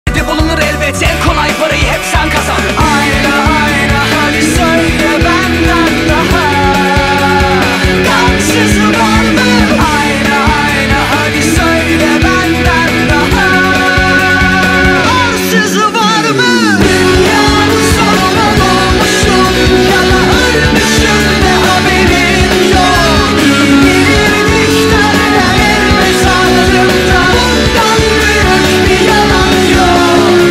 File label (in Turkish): elektro gitar cover